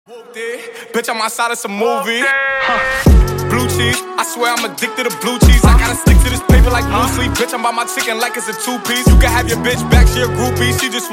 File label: remix